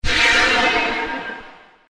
lightning, force

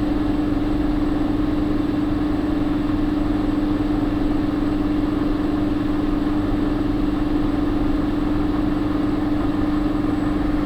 vent fan drone

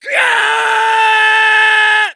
falling

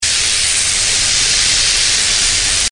lightning, force

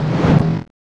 fan blade

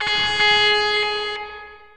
alarm loop

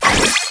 use bacta

